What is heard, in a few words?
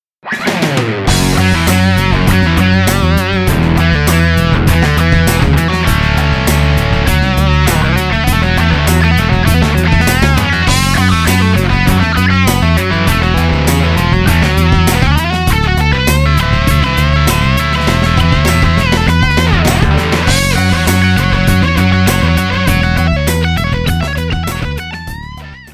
patch demo